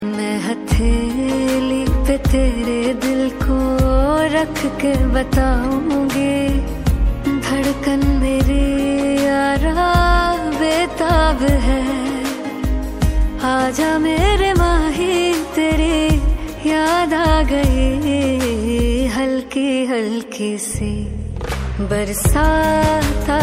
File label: Female Version